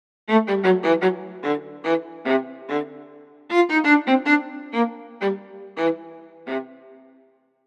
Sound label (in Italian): VLA solo stacc